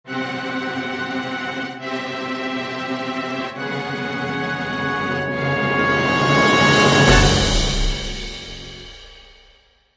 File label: Big Tension Builder To End